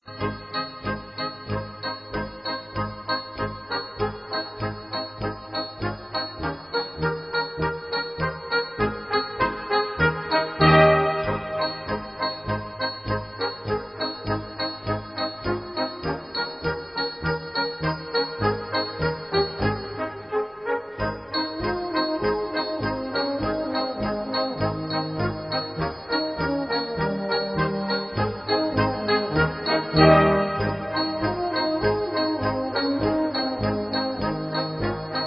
Amboss Polka

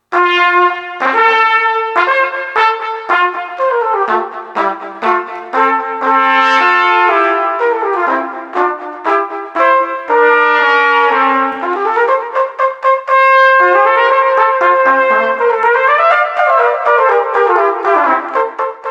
Zwei froehliche Musikanten Duett

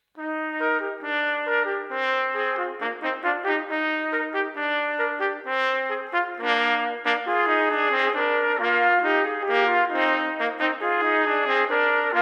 Duett

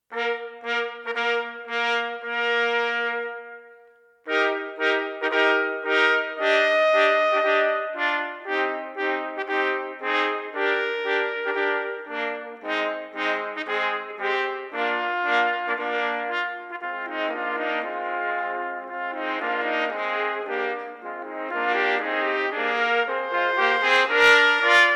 Trio

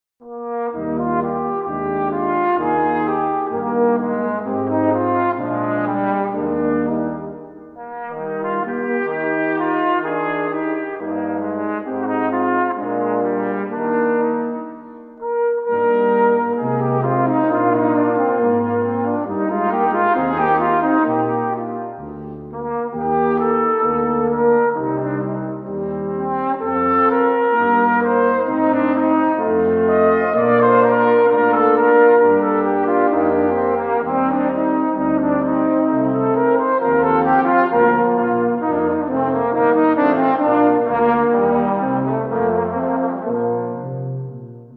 Choralvorspiel; Wachet Auf Ruft Uns Die Stimme

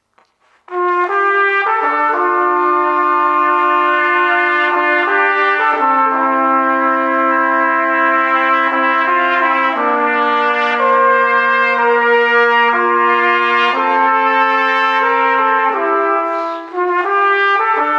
Duett

